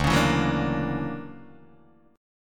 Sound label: D Augmented Major 9th, Guitar, Open D